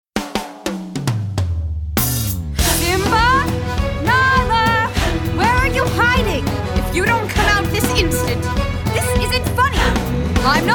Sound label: Young Vocals